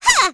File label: Vox Attack; Pansirone; kingsraid